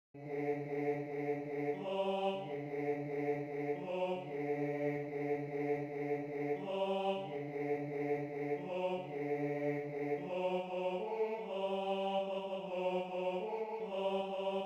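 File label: male choir, Bass I; Mir lebfrischn Hirtn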